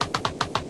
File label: charge crab, Voices Emotes